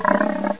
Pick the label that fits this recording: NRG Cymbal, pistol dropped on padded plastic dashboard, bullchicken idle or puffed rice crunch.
bullchicken idle